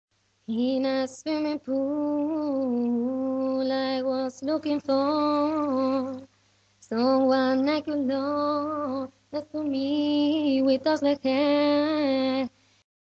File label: Vocal